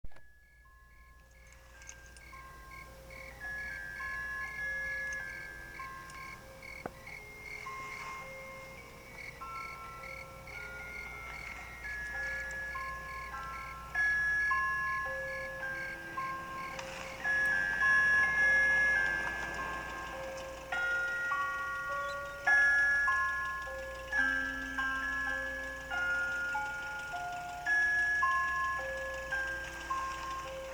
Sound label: Waltz; Waltz With Bashir